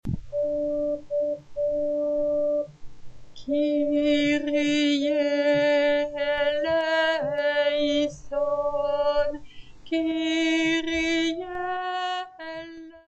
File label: Kyrie Alto, Chorale